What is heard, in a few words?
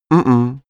male deny mhmh